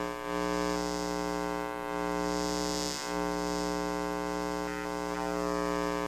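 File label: ambient hum buzz loop, electric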